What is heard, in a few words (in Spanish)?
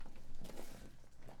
Ropa